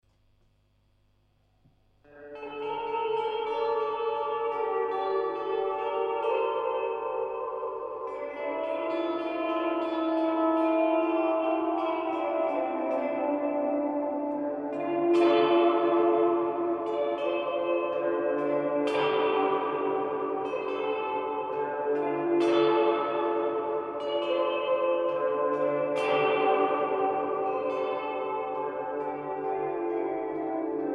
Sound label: Plate Vibrato; Silverlake